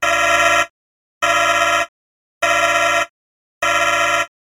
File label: cockpit alert